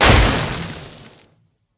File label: impact ground lite